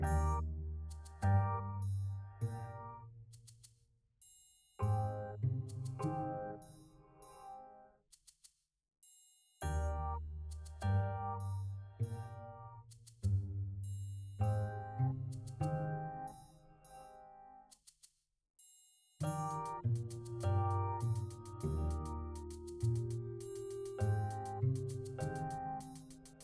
smooth urban loving

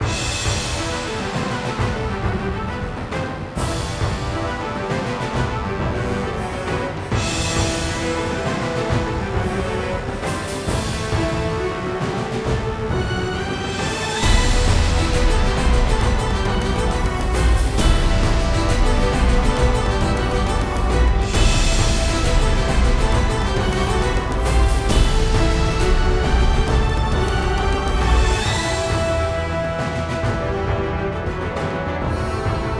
epic victory

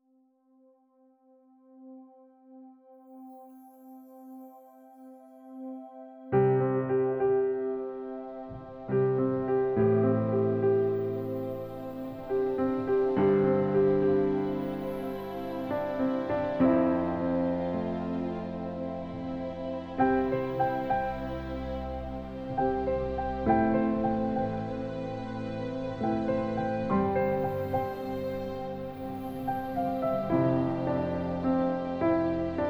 loving sentimental pleasing; Jamie Coleman, Film Composer

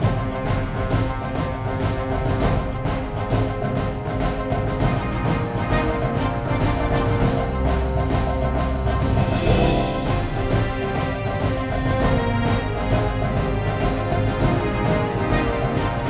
Greek Goddess main theme

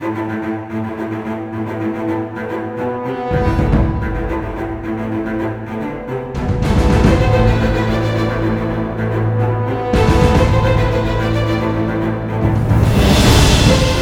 epic action heroic

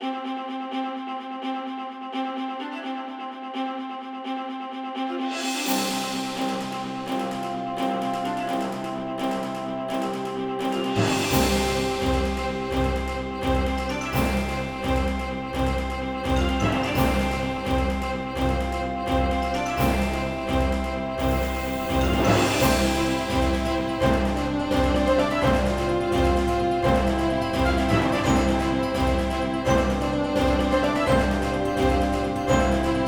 fun holiday

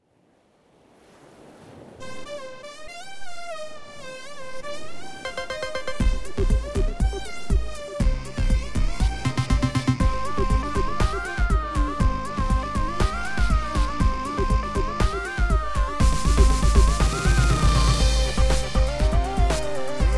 edm

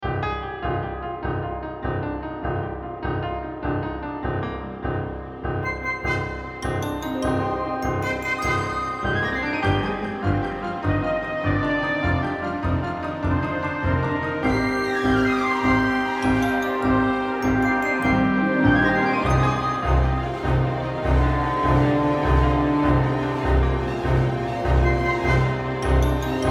suspense

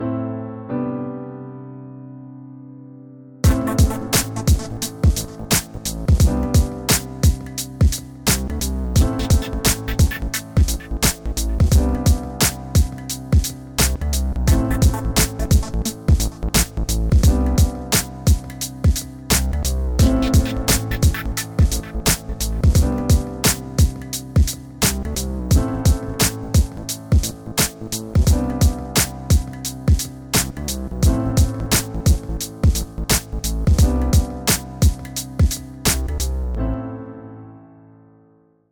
Chill Hip hop

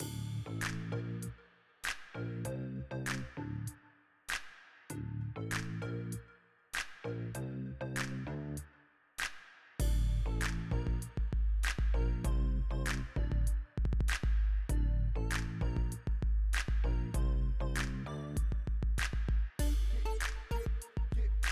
club bounce; Jamie Coleman, Film Composer

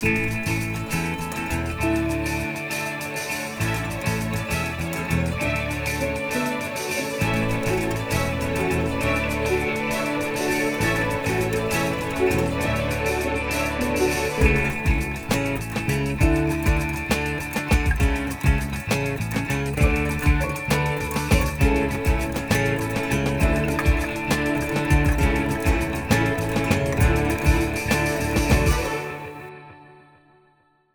Pleasant Caring; Jamie Coleman, Film Composer

